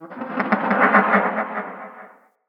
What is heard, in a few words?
Creature Alerted Call Far, Wildlife; Midrath Island; Ambience